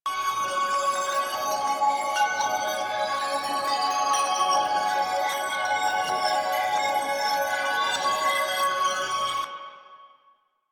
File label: Obelisk Chimes Loop; Warframe; Quests; TheNewWar; Ambience; CrpGasCity